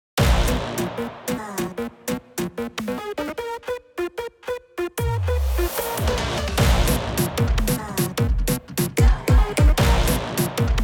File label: inst